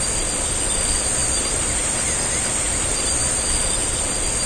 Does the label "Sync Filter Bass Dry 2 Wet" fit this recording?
No